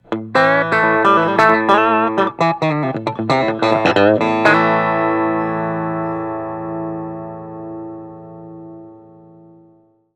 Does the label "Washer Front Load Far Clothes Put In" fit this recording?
No